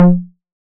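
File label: 808 low conga, Tape Sat; Goldbaby Tape808 & Tape909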